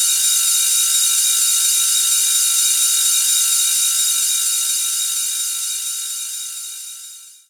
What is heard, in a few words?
FX Misc; Mutekki Media; Vengeance Electroshock Vol.2